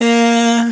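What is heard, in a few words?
riff Airy Yeah Chop G minor, Vocals, Dry Vocals; Raw Vocals; Slate Digital Omnivox Vocal Sample Pack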